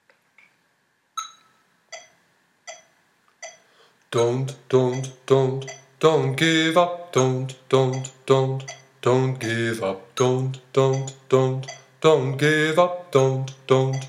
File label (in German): Endteil Bass